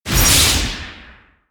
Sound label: BOSS Fabao Skill